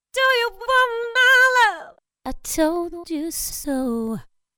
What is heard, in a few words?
Vocals; Presonus Studio One 6